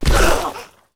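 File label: ghost damage, monster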